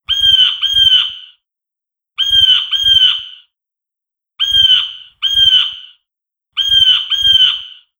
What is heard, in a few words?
bird